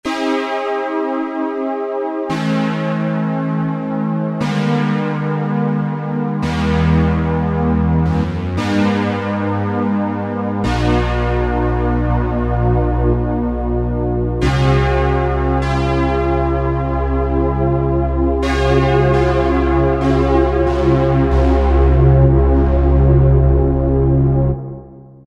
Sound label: JP-6 Stack, Roland SR-JV80-11 Techno Collection